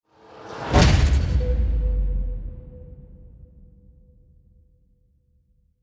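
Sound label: Music Ambient Transition Race end sweetener; Forza Motorsport 6 Apex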